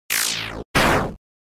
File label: attack slash; undertale